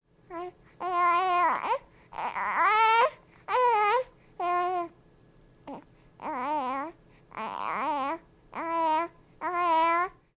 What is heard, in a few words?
Aye Ayes; while irritated